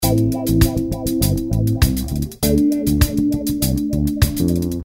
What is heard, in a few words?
mello groove